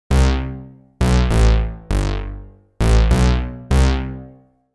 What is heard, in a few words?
Plasma-Bass rev piece